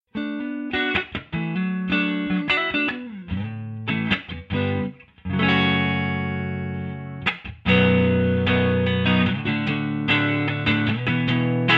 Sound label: clean